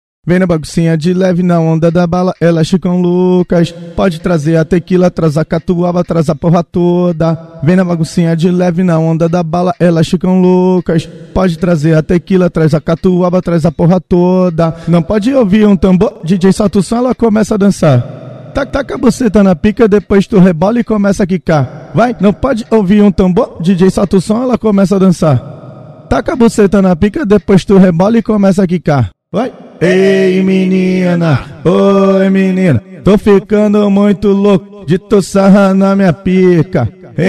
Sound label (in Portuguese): acapella funk